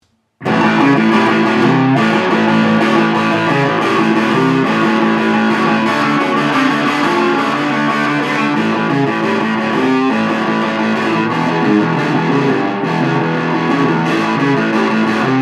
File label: rock